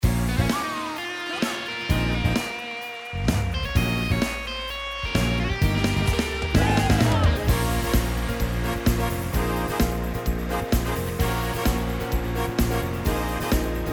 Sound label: Live Soul Band